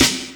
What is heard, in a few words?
snare